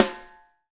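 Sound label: SD, snare drum; Real-Kit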